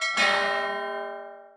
jetski; giants

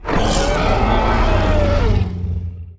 ROAR; giants

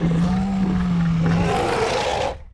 idle, sonak; giants

